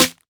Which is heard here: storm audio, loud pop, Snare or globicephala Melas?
Snare